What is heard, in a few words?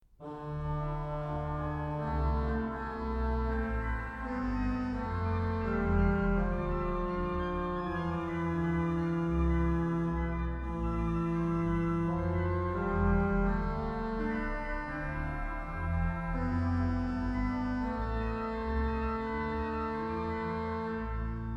Trio over psalm33